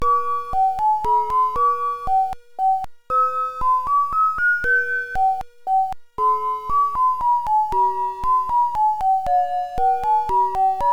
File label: sine tri